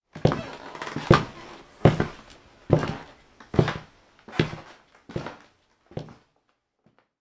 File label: Footsteps Creak; Irma Vep